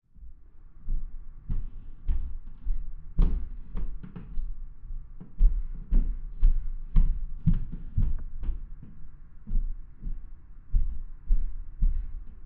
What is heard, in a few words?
Footsteps; Male Walking, From Upstairs.